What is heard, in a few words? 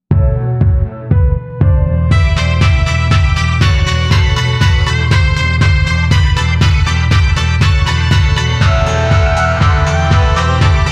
halloween dance